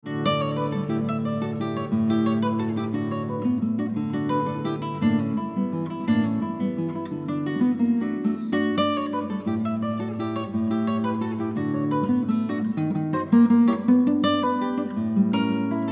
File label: Waltz